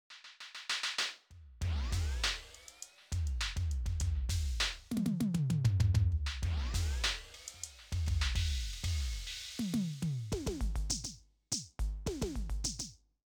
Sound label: Simmons SD1200 SDS V